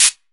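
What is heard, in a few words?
Cabasa, Roland S50 Percussion; Drum Samples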